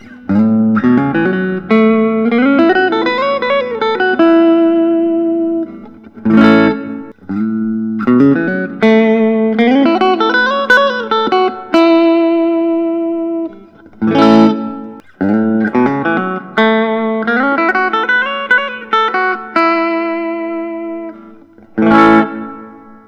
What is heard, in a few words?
gretsch custom shop; stephen stern